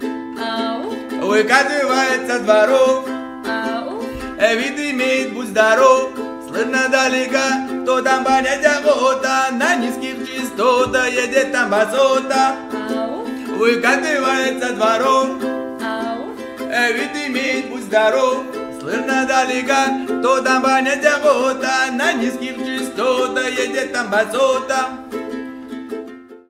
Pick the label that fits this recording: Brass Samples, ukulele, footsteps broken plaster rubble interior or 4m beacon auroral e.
ukulele